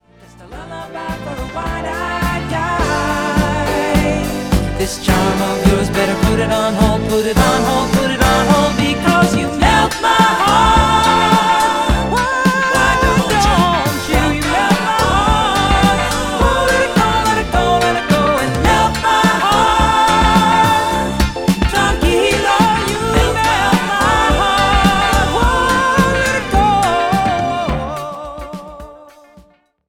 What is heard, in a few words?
pops